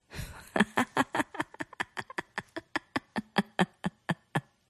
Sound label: human FEMALE subtle laugh chuckle